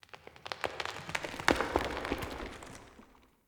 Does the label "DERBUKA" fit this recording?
No